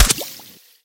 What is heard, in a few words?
underwater impact bullet; physics; surfaces